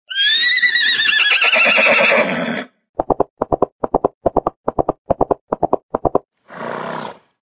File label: horse